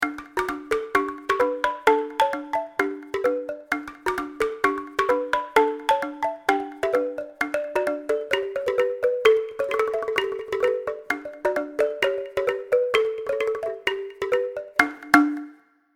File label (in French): son balafon D son